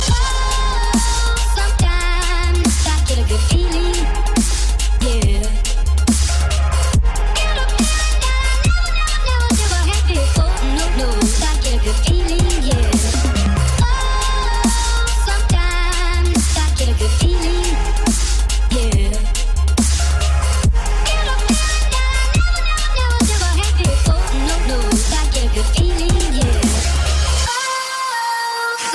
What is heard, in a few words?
Dubstep Remix; UK Punjabi